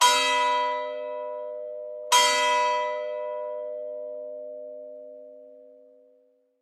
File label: Ambiance Bell Church x2 Stereo